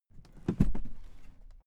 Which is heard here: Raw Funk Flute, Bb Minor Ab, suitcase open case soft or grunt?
suitcase open case soft